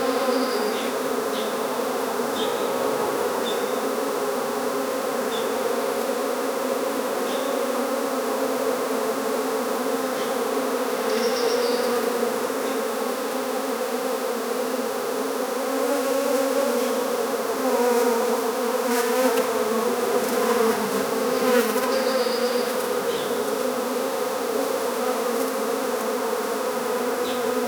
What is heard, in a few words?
forest bees rare birds